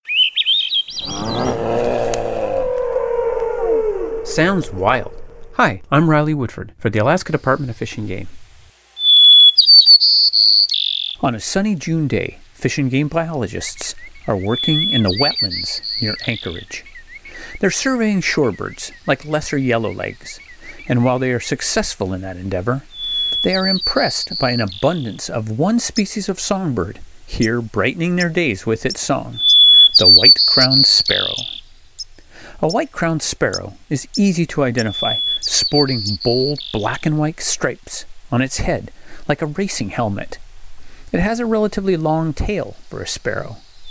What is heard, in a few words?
white crowned sparrows